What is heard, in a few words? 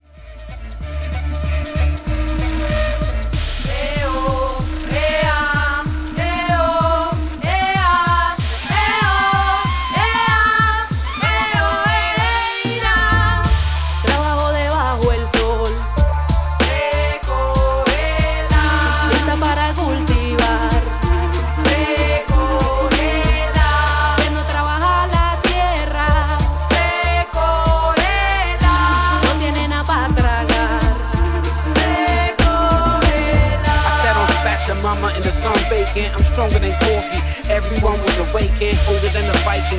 Dub